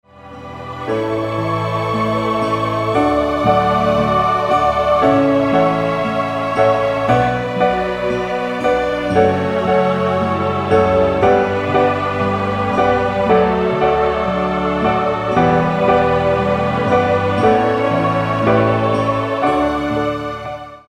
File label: Canon in D